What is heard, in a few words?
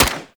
SMG Shot F; Tenno; Sundial